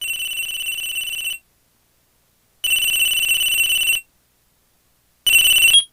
phone ring; trilogy

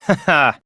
haha; byakuya

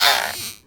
crying; hugh

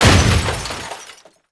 crash

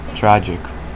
tragic; snake

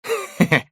giggle

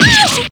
damage; yukiko

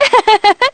laugh

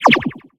squish

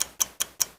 watch second; vortex

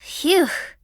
"phew..."; tressa

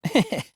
hehe; shuichi

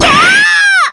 damage

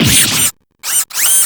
damage

